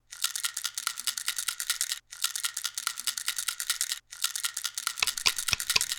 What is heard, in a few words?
Rattle